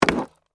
Skateboard Up, Sports, Skateboard, Pick, Up, Wood, Surface; Skateboarding; BlastWave